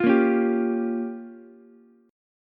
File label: G Minor 7th Flat 5th, Ukulele; Gm7b5 strummed; JGuitar